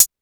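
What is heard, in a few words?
closed hi-hat; Roland CR-80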